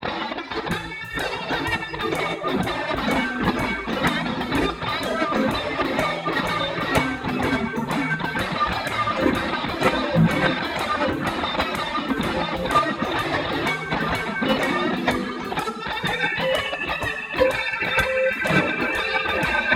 Free Music percussive, Phase based harmonic percussive separation